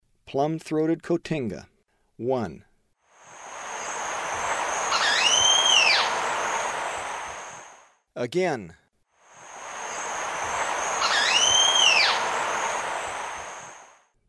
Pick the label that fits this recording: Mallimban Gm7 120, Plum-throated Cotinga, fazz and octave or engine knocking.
Plum-throated Cotinga